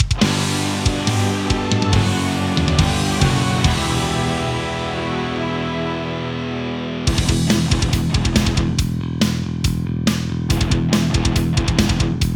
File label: Fanfare Fail Start